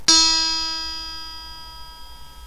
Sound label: 1 string